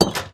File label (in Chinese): 铁门关闭声